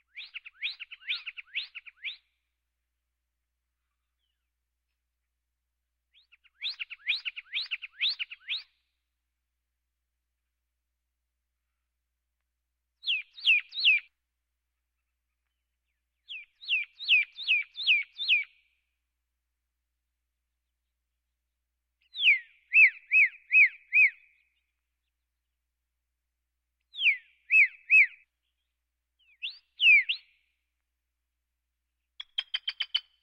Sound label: Birds